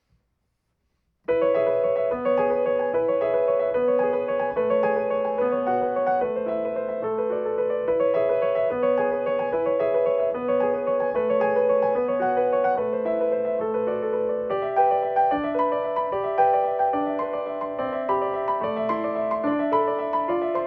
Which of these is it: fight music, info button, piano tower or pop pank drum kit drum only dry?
piano tower